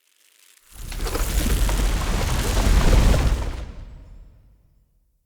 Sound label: Root Door Close A; Neathuns